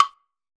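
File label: Wood block, Roland S50 Percussion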